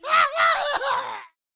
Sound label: Barrel Roller Death Vocals